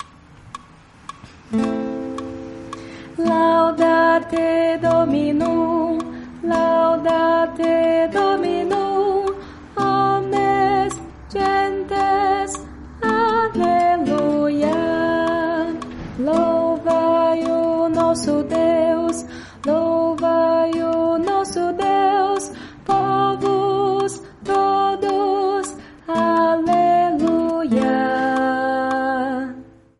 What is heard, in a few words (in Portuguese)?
contralto; Laudate dominum